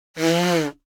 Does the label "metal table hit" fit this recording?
No